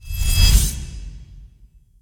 sfx score end; Skylanders Cloud Patrol; Arcade, iOS, Mobile

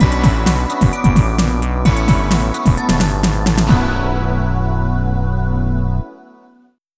Defeat; Contender; PS1

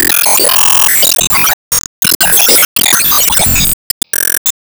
Zantetsuball Slash

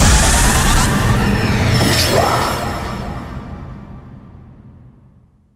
Login Decided; beatmania IIDX 15 DJ TROOPERS; Arcade, PS2

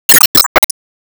Enemy Armor Equip

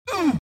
Mom Vox Hurt; The Binding of Isaac

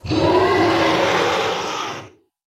Roar; lethal company

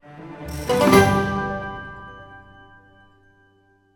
stinger Chest Opened; lego horizon adventures

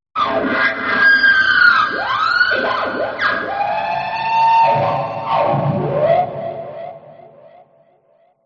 Clown's Fight Strings; Clown s Fight - Stage 1

